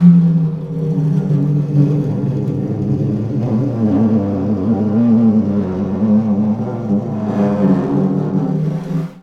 Snare Drum; Percussion Samples

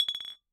Bottle Struck

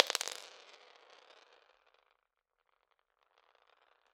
Drop On Floor, Medium Wine Glass

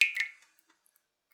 Drips

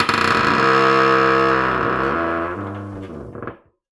Door Creak

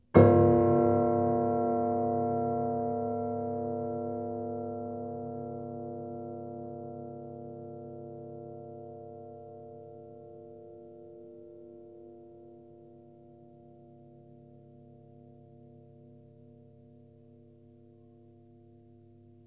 Harm Ped Low, Piano Harmonics

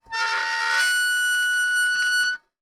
Soldering Iron Stand Drag

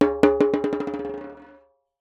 Jembe Bounce; Percussion Samples

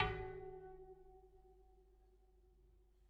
Bicycle Spoke

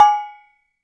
Pang, Kitchen

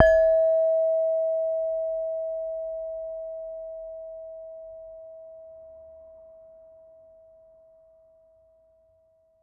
Saron B, Gamelan

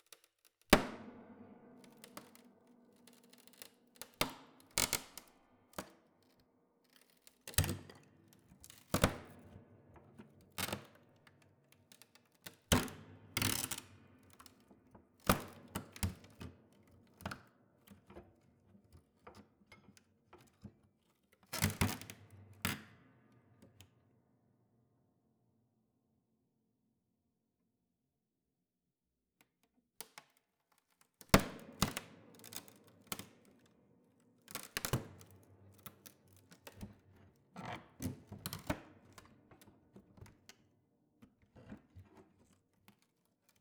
Splint, Piano Destruction

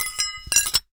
Hole Saw Rattle, Hole Saw Bits

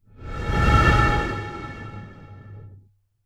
Bottle Blown

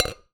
Tap Glass, Medium Wine Glass

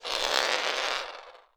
Roll On Floor, Medium Wine Glass

